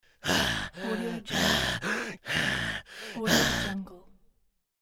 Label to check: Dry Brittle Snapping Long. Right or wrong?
Wrong